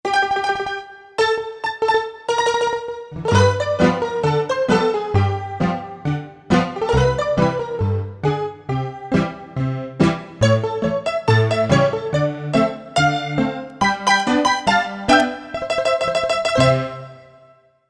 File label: Player Pno 2